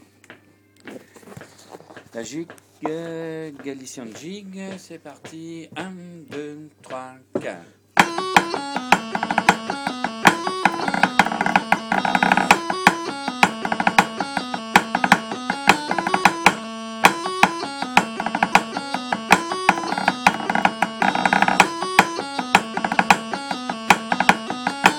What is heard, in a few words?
Galician Jig